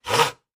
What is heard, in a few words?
handsaw stroke